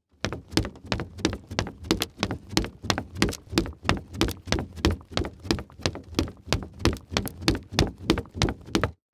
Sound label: Foley Footstep Hard Sole Run On Wood; Foley Footsteps